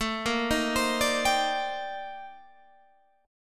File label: C Minor 9th, A, Guitar, Standard; Cm9/A arpeggiated; JGuitar